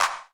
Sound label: Clap D#1; Roland U220